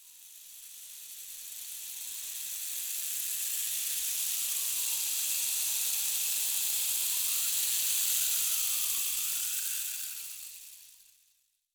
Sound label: RAIN ST, JUNGLE SOUND; Voice Crystal - Steve Reid Definitive Percussion